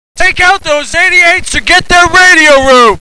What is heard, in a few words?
glider allied